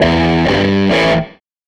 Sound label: SG Guitar Loop